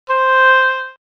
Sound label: Oboe C5